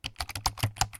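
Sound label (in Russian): быстрый набор на клавиатуре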